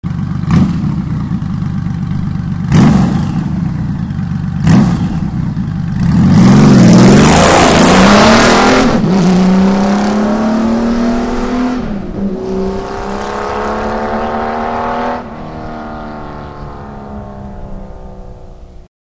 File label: acceleration